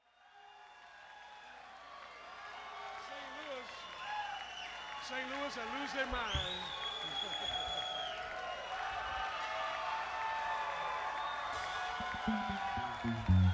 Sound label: Crowd